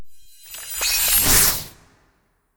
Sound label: Ice Thorn